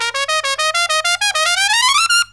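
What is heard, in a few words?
solo trumpet, Killer Brass Riffs; USB Soundscan vol.29 - Killer Brass Riffs; AKAI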